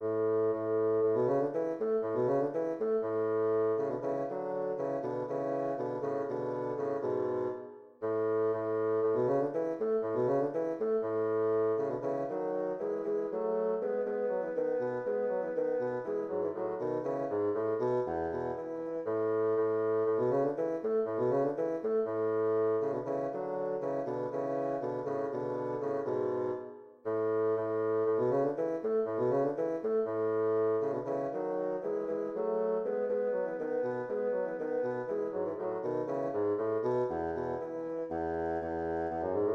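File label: duet 2 bassoons; molter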